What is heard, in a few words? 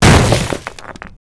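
bust concrete, debris; cstrike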